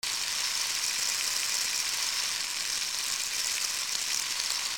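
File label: percussion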